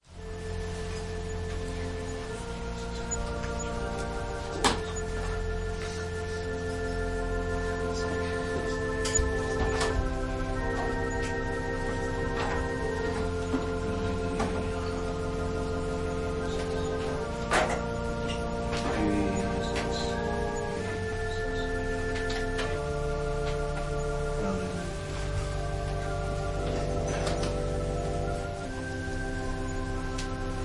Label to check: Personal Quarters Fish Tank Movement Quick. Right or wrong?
Wrong